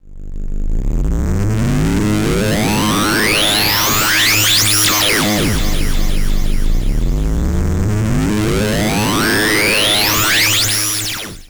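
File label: SYN, synth, Drum & Bass; Best Service ProSamples vol.03 - Drum & Bass 1; AKAI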